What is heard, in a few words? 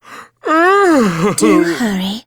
yawn; rachel